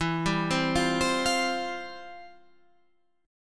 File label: F Major 7th Suspended 2nd, E, guitar